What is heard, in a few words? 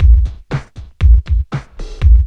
LOOP, DRUM; E-Lab; X Static Goldmine 2; akai